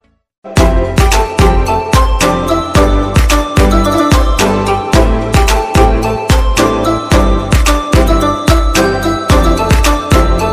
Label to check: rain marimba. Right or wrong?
Right